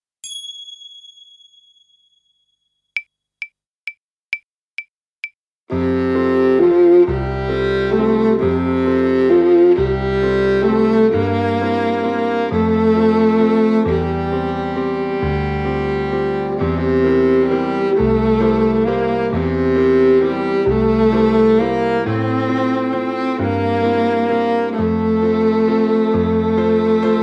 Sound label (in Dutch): Altviool